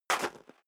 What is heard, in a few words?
step high heels gravel light single stair up, footsteps; schritte; menschen